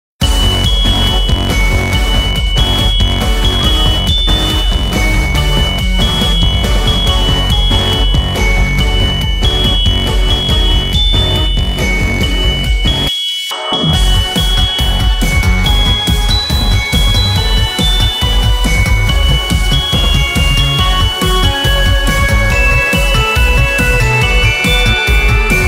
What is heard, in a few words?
Glockenspiel; The NecroDancer [Glockenspiel Ver.]; Cadence of Hyrule